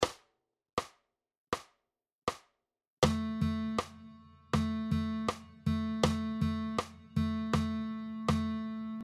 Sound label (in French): Dictées de rythme, guitare